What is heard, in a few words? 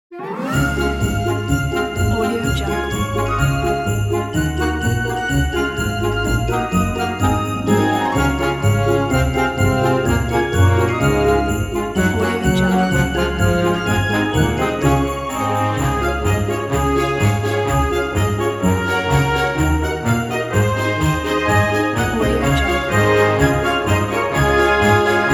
jingle bells